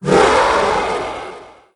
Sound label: shield break; Apotheorasis